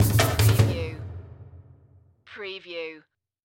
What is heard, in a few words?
Musical